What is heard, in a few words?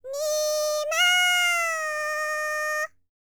BULGARIAN, World Voices, BALKAN